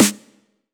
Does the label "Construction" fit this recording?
No